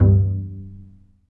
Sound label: Bass Piz D G1; Digital Sound Factory Virtuoso